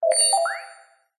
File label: science fiction computer tone interface positive successful beep complex